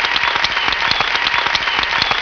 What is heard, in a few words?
crowd part; Stunt GP